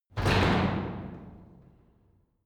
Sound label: garage door close short hard